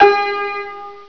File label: Santour; Prince of Persia 2 Demo